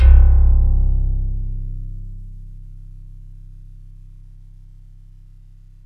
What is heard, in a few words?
SLIPPICK; MORPHEUS